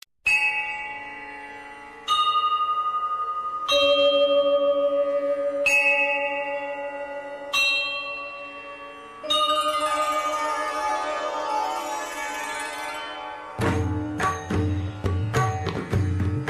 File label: Temple Bell Ringtone Remix; ringtones